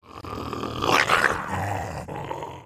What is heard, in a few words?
Angry wolf attack